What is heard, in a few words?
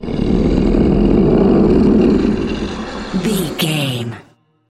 troll monster growl